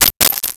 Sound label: Coin Falling on Floor; 1001 Sound Effects